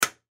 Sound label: Light Switch Off; Household; Blastwave